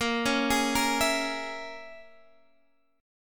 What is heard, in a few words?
A sharp Minor 7th Flat 5th